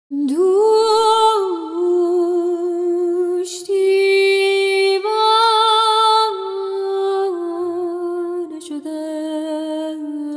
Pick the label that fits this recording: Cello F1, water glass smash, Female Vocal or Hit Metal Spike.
Female Vocal